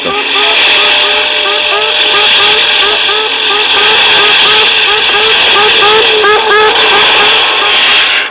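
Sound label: Sputnik 3 sound, satellite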